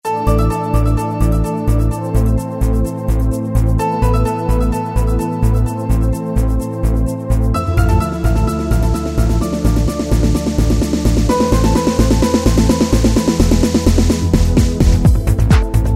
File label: Kids, Yamaha YPT-400